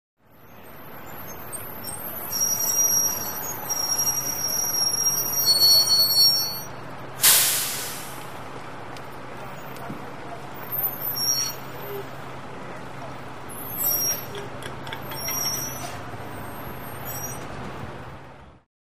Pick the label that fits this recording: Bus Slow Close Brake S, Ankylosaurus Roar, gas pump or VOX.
Bus Slow Close Brake S